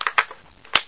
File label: ar1 clip in, weapons; hl2